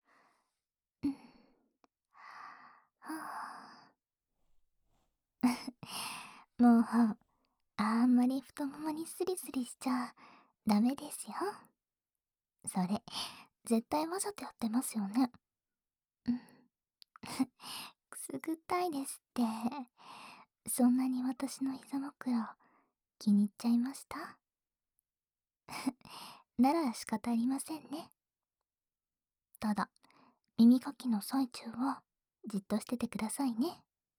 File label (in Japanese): 至高の耳かき&耳舐めご奉仕(左)